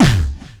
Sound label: VOC DRUM, MOUTH PERC; Best Service Hyper Dance